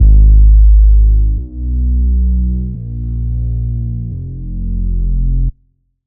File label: Bass; Routed Sound; Spire Expansion - Flame Summoner Vol.1